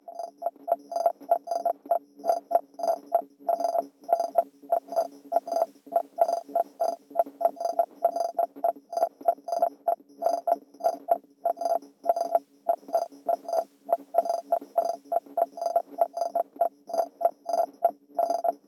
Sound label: 120 Noises Ambient Samples RIMS